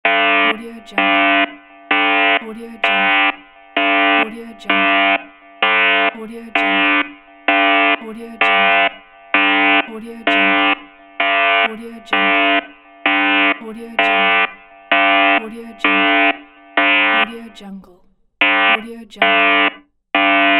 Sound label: alarm